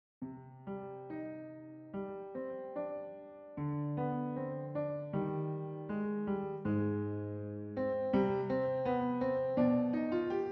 Piano

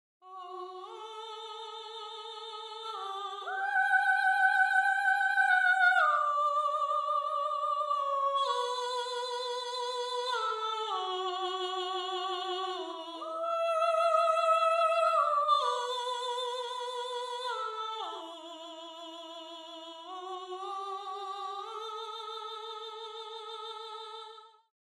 Soprano

